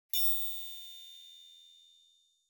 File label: Spring Thin Sci-Fi Button; Hollywood Edge; sound designer's toolkit v2